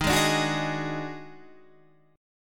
D sharp Minor Major 7th Double Flat 5th D sharp Guitar DADGAD